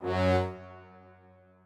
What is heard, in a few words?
strings